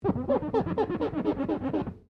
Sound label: GLASS SQUEAK FINGER SEQUENCE; Material components for constructing more complex sounds